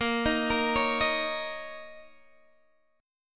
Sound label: Bb Minor Double Flat 5th, Guitar, DADGAD